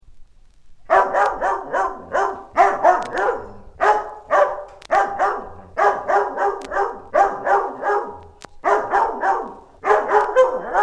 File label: barking dog